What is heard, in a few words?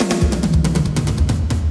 Fills, Loops 140 BPM